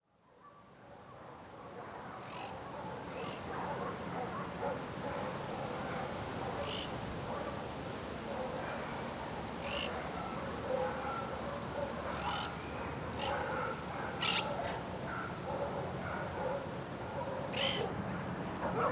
AMB EXT ATARDECER Puente La Barra UY Generico pajaros

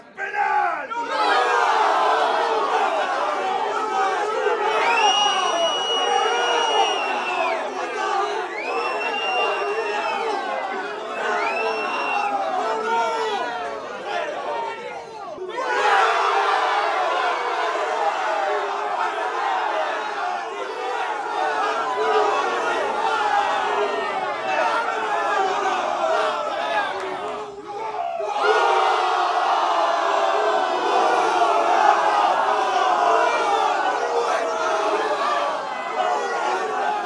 GENTE MIRANDO FUTBOL URUGUAY Exclamasiones Uruguay Gol penal INTERIOR

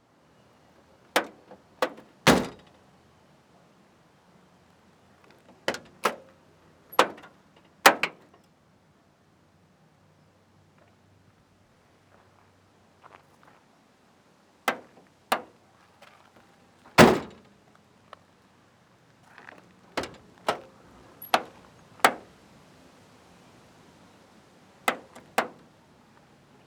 CAMIONETA EXT Combi puertas abren y cierran

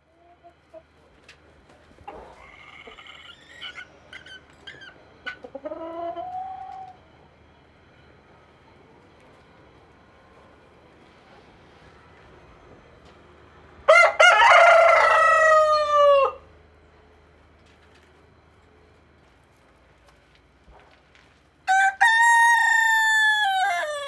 GALLO Y GALLINA CACAREAN interior

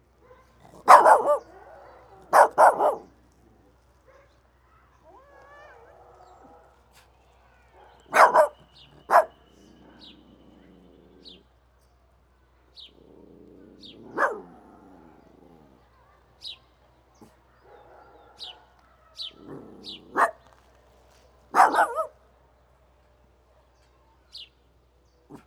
AMB EXT DIA PUEBLO Playa Pascual UY Ladrido de perro

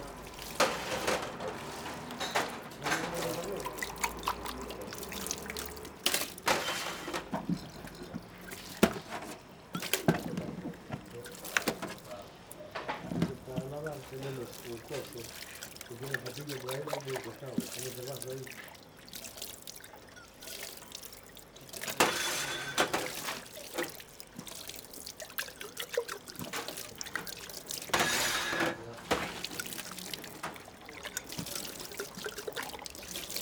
AMB INT BAR UY Lavaplatos; Arocena

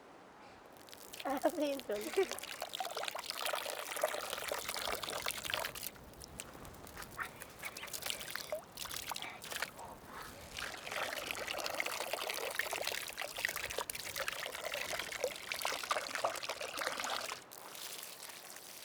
RIEGO BALDE AGUA

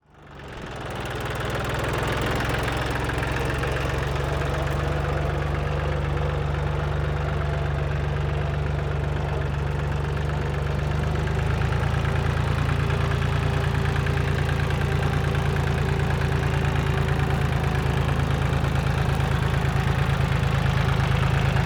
TRACTOR pasa modera prende y apaga

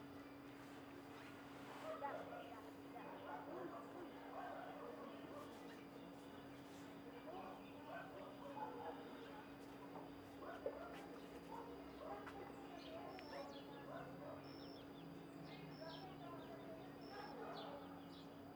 AMB EXT MEDIODIA VIVIENDA RURAL; P de los Toros UY Generico

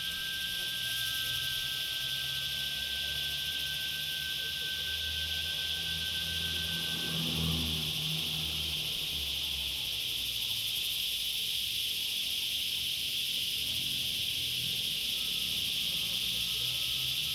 AMB EXT TARDE CIUDAD Corrientes ARG Chicharras en patio de Iglesia

